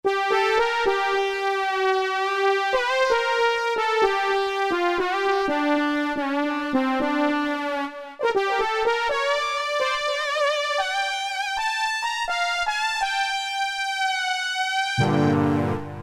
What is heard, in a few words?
Yamaha TG33; SL-Chic